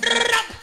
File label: brrrrrr, Voice; ooh ahh